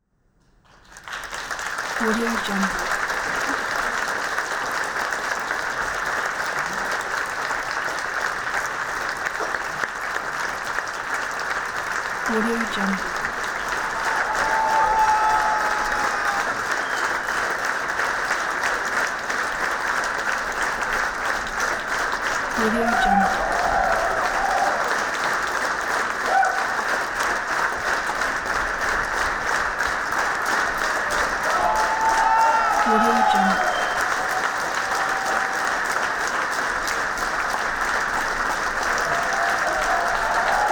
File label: applause